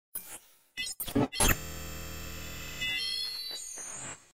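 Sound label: percussion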